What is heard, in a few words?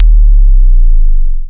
808 kick; stompkick